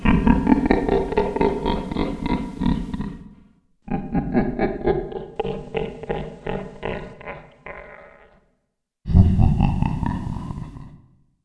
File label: laf